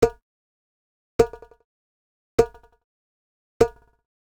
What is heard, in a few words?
Digital Click Neutral; boom library; The Interface